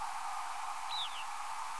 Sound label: Possible Bicknell s Thrush; ETNA NY